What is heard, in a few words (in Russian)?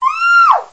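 женский голос крик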